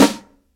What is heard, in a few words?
rich lows snare drum c sharp key